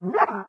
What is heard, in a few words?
Calf Boy Idle